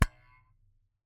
Camera, Aimex SP500, point n shoot, plastic, knock; Eiravaein Works; Vaeyan IV